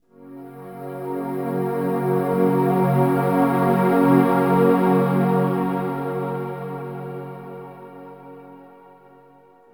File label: Vox Orch Chords; Drones Abstract